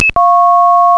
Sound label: coin collect, tones; 2600; phreaks and geeks